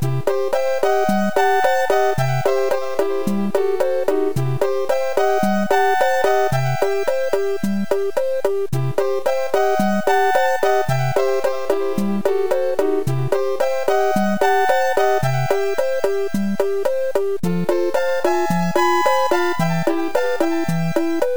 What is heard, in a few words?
Underwater, music; smw; smw1.7